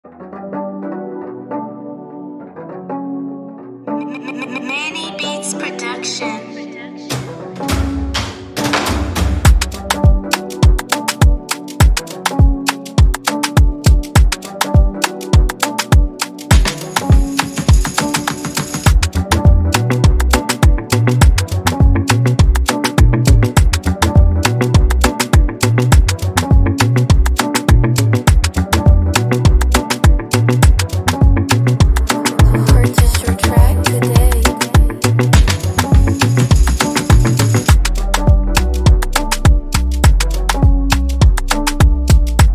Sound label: Afro Beat Instrumental